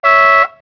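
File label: car horn; Microsoft Graphics Studio; Home Publishing 99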